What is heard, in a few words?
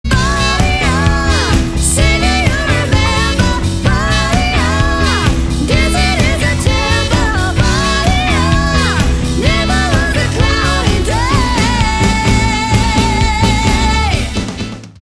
6-piece (keys-sax)